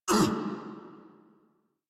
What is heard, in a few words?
Pain, Battle; en; Warframe; Grineer; Catbrow Handler